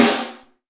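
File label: snare drum, Real Mega Drums; Best Service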